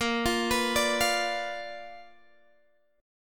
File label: B Minor Major 7th Flat 5th, chord